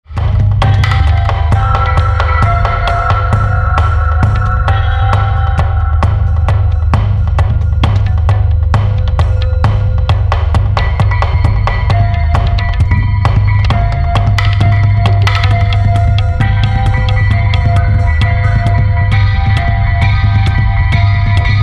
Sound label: fareastdrums